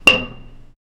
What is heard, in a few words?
tavern